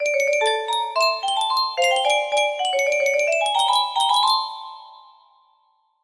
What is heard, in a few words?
melody